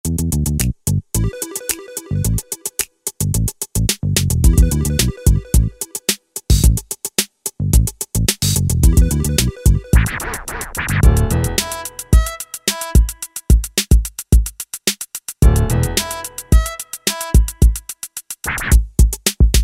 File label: OldSkool, Yamaha DJX Styles